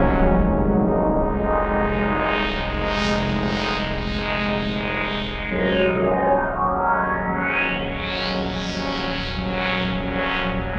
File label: philter pad; Pads; Sonic Foundry (Sony Creative Software); Ugly Remnants VOL-2